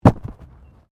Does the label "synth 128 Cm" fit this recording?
No